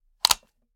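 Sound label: selector on, weapons foley; gmod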